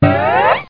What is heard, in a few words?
boing neck; How Many Bugs in a Box; juicebar